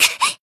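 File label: Voice damaged, Japanese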